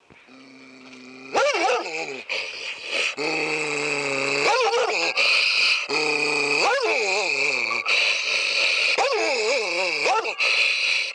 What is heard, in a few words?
growls